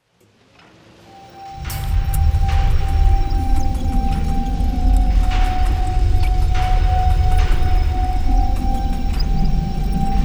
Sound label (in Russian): bolshaya sinica golos